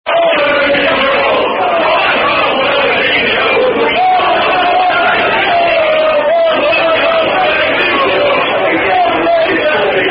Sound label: Unknown Football Chant